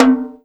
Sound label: Tom Tom High